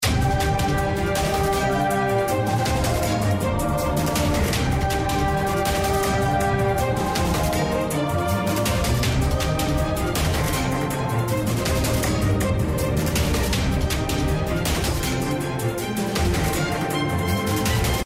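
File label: bonus background music; bonus bgm; slots; sRoma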